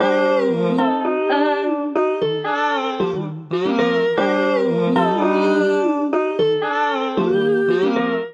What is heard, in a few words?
Sliced Melodies G Minor 115 bpm; MIDI Clips; Ableton; Factory Packs; Voice Box; Harmonic Racks